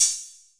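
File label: 8000 hi-hat, Roland CR-8000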